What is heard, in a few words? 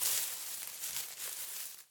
Bushes rustle; Phasmophobia